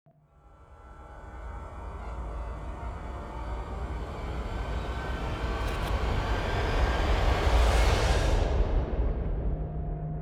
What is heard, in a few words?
Ambience; Monster Hunter World Iceborne